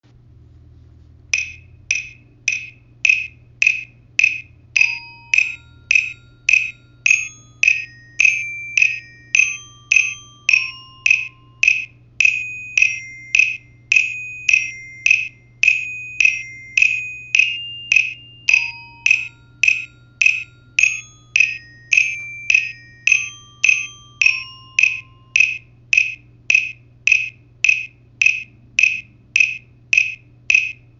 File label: bells